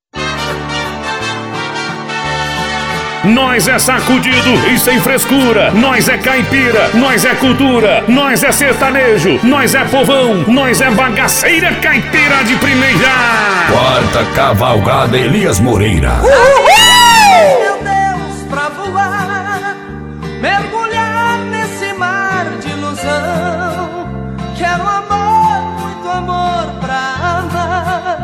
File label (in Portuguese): sertanejo classico